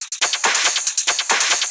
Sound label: Pitched Loop, 140 BPM; Vengeance Samples; Vocal-Hook Kits; Song Kit; Mr Big Boom